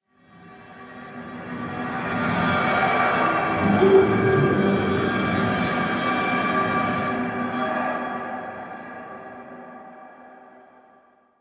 Metallic Drone; Warframe; Ambience; Nineteen Ninety Nine

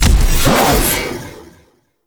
Backstab Self Impact; Warframe; PaxDuviricus

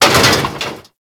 Step Metal Debris; Enemies; Steps; Warframe; Corpus; Arachnoid Camper

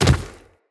Infested Medium Land Dirt, Footsteps

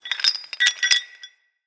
Swing Chain Move

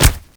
Rush Step; Warframe; Runner

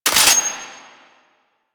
Exalted Sniper Low Clip Mech; Warframe; Frumentarius

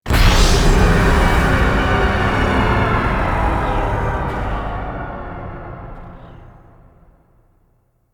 Organ Stinger; Encounters; Ode Pollution Tower

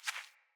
Hare Footstep; Foley

